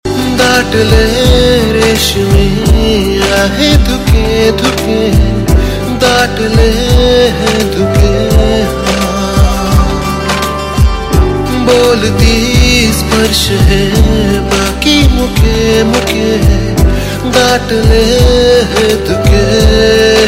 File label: Marathi Ringtone